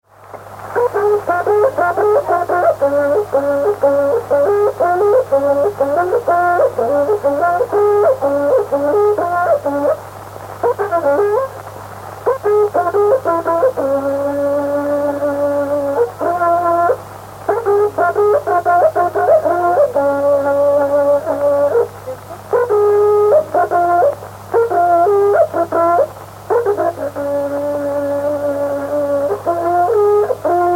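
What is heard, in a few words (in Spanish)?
Toque de trutruka, Mapuche; Puede ser en Boroa, Collico o Dollinco; Música Mapuche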